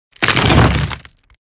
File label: Broken wooden objects